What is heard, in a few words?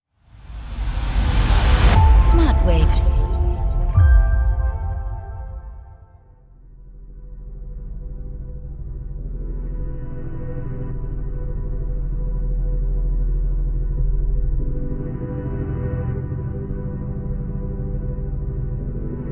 friendly bells